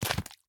minion footstep dirt; smite; npc; roman archer

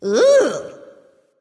"ugh"; smite; voicepack; nike

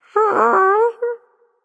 sigh, voicepack; smite; jorm

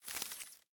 armor; smite; ahpuch skin10

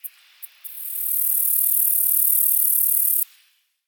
ambience insect; smite

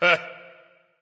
"heh heh"; smite; voicepack; ullr

